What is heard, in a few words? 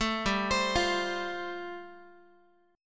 G 7th Suspended 2nd Suspended 4th G chord, Guitar; G7sus2sus4 arpeggiated